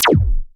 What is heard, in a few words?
Noiz Laser